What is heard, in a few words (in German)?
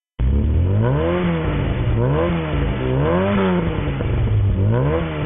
1300er 90 ps ohne kat